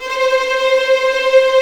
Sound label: C5 TREM, VLNS TREMOLO, String; East West; The Ultimate String Collection